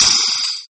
High metallic pounding

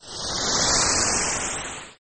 Whish sfxr

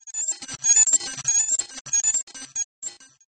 Glitch chiptone

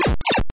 8 bit pitch descalation effect

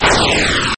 Rocket short

